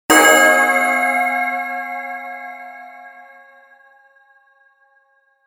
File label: Bells Multiple Attack Panning; 5.1 STEREO MIX; Blastwave